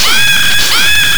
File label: SCREAM VOX L; Big Beat Samples & More; AKAI; VOX FX1 KIT